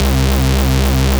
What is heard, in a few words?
C Bass, DnB One Shots